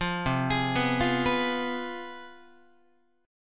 C Major 7th Suspended 4th Sharp 5th, down, Guitar; CM7sus4#5 arpeggiated; JGuitar

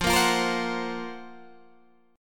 F sharp Major Sharp 11th F

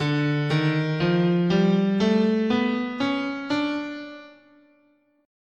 D Neapolitan Major, guitar, scale

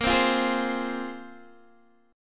A sharp 7th Suspended 2nd, Guitar Open D chord; x,13,10,11,11,10

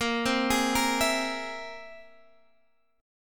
C Augmented 7th Bb

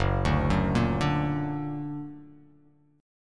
Gb Augmented; Gb+ arpeggiated

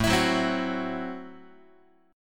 A Minor 6th A Guitar; Am6 strummed; x,5,7,4,4,5; JGuitar

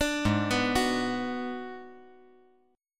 G sharp Minor 7th Flat 5th G sharp down; G#m7b5 arpeggiated; JGuitar

